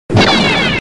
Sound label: Cylon Rifle; BSG